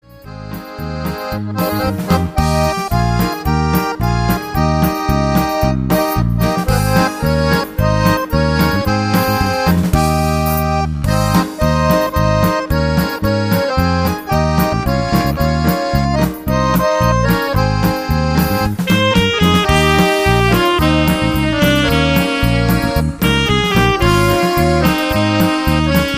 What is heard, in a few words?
Polka